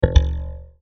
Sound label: Mouseover Spring Popup; Multimedia; Blastwave